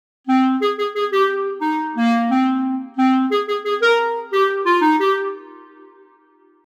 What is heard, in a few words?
Keyswitch